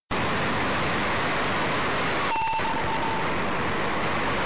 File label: Meteorscatter ping